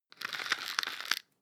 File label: floss pull out; haus-garten; badezimmer